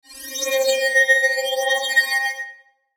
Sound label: Logo Flange Sweep; Sonic Logo; Blastwave; Sonic Logos; Production Element Imaging Element Accent Transition